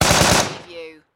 Machine Gun M4A2

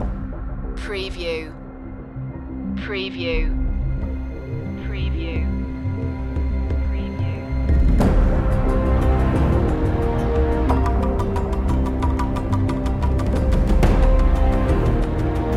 underworld series dramatic cue

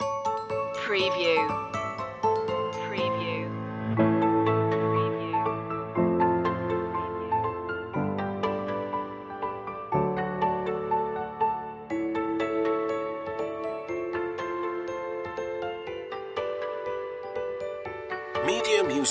bell shoppe

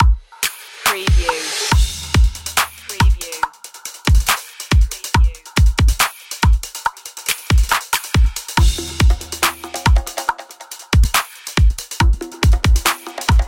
hip hop urban drums

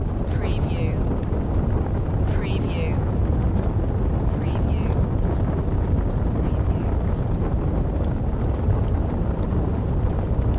Fire Flames Loop

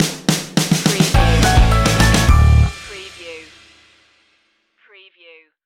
positive indie stinger

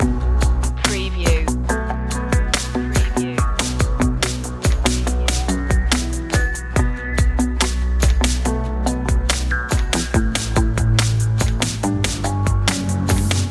dark trap loop

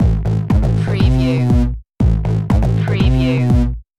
unnatural science loop

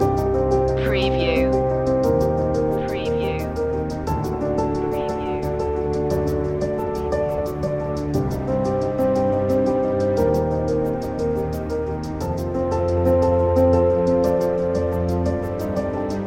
motion loop

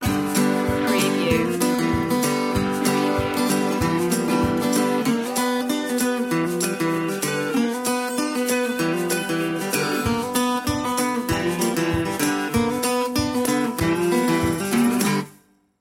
rooster chasin blues

